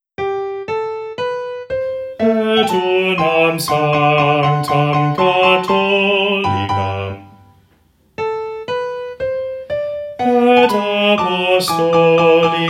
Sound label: Bass